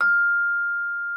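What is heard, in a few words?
vibraphone F6; Xsample VOL-4; VIBES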